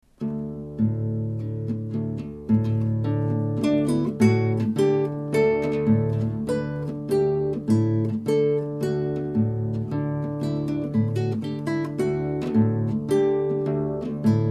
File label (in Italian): salterello